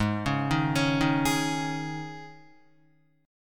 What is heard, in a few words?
G sharp Suspended 4th G sharp, Guitar; G#sus4 arpeggiated; 3,4,3,6,3,4; JGuitar